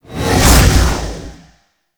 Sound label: Guardian Shell Cast; Warframe; Focus; Vazarin